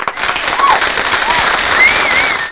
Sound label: Applause Sound; MineField 1.1